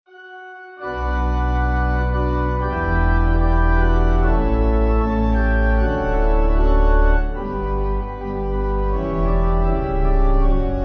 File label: Melodic Pipe